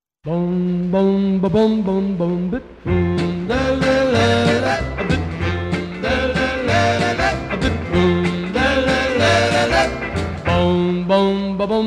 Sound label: Doo Wop; Wuschel's Doo Wop Paradise Volume 150